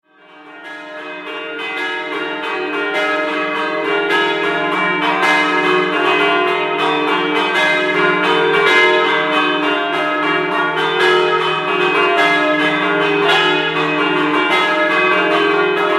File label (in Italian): campane; CASTELMONTE